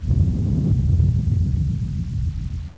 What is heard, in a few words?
Flame